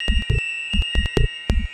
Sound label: amb 138 a#, Techno, atmos; Techno ID; atmo+fx-loops